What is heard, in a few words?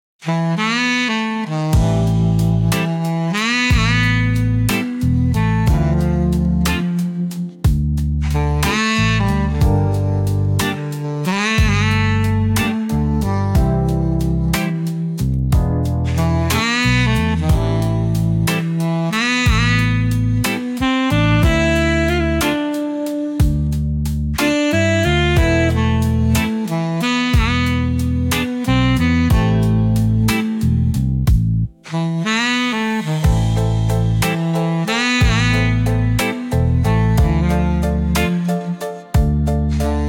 sax